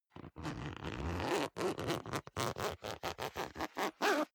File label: zipper down jacket open unstable; objekte; reissverschluss